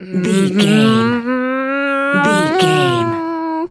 voice funny cartoon misc

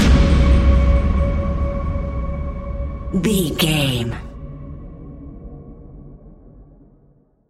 eerie encounters stinger

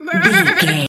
sheep baa

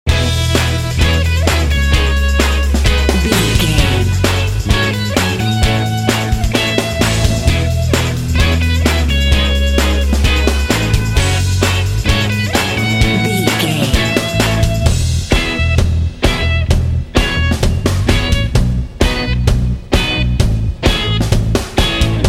indie geeks bumper

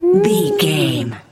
voice fun small character emote interested

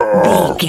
voice orc grunt

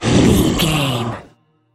troll monster effort growl small

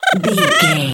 goblin fairy expression emote

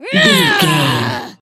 voice female a battle shout

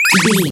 retro collect pickup item

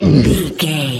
troll monster hurt pain short

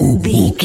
gorilla chatter

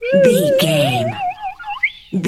whistle slide wobble notes long

